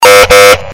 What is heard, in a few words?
police horn loud